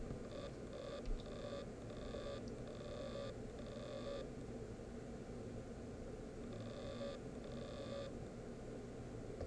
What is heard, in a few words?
hd sounds, laptop, bad; mac disasters